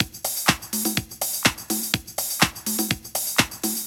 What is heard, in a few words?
tech house fill with ride; drum loops